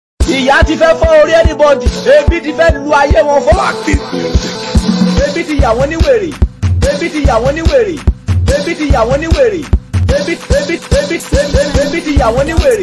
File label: Beat